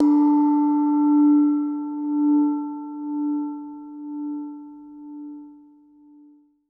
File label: E TIBET; Best Service Advanced Media Trax Modern Composer; ETH TIBET